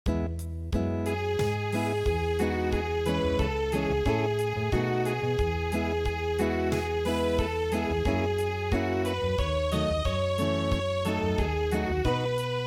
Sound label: Acoustic, traditional